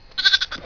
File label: baa lamb